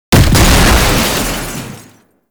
Shield Lancer Weakpoint Destroyed; Warframe; Lasrian Soldiers; Weapons

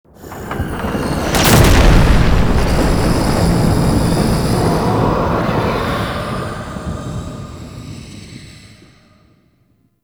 Exterior Thunder Clap; Ambience; Warframe; Duviri; Murmur

